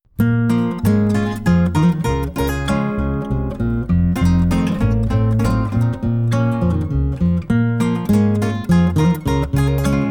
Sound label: Cafe Latino